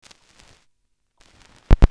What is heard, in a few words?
vinyl; Masterbits; Vinyl Attack